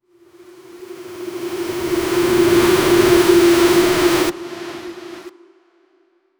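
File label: futuristic dystopian ambience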